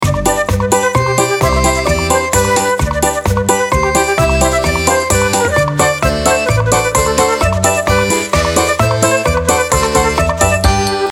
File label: Russian Folk Loop; Vo Kuznetse